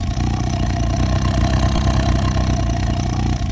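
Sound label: Tiger Idle Loop Constant; big cats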